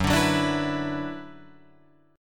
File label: Gb-7th Suspended 4th Sharp 5th-Gb-6,9,6,9,7,6-1-down-Guitar-Open F; strummed; JGuitar